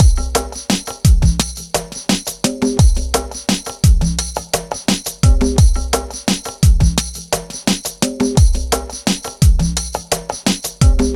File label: DOWNTEMPO BEATS